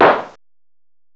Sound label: CLAP; PERCUSN; M8; CMI Factory